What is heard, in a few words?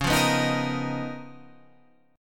C sharp 13th C sharp, Guitar, Standard